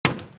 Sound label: Footstep Metal; Footsteps